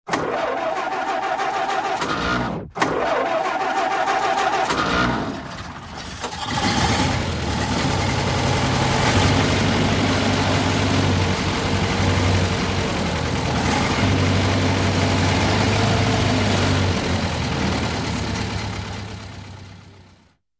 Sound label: Car Starts Revs